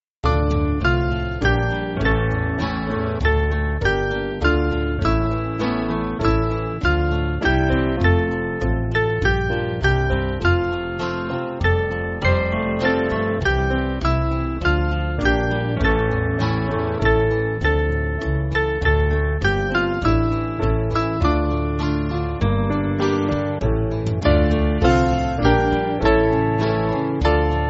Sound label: Band, church music; With Broken Heart; Babylons Streams